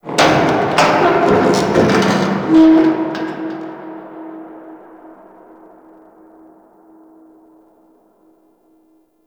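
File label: Ice Metal Distant, Ambience; Warframe; Corpus Ice Planet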